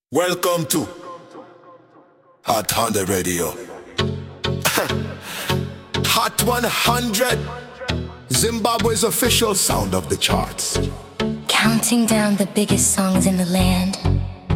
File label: jingle; radio